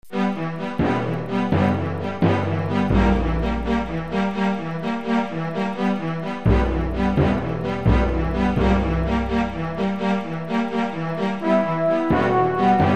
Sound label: Format Countdown; Space Quest 4